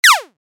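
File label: cancel; 攻撃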